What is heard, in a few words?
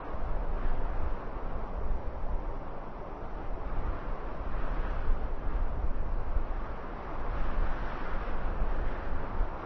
ambience; Bigrigs